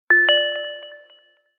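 standartnoe sms soobsenie na samsung galaxy note 3, standard samsung galaxy note 3 sms message; metalliceskaa kapla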